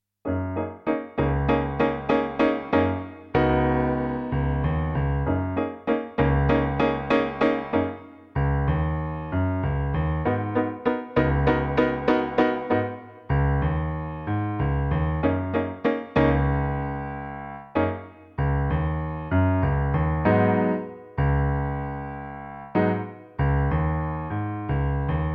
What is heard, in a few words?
karaoke